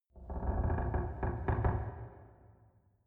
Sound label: church creaks